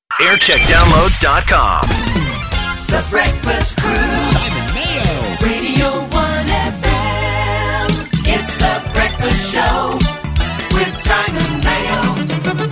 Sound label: jingles; radio 1; simon mayo